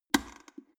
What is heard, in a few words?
plastic water boiler open lid fast